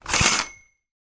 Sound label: household curtains on metal rail short movement; zapsplat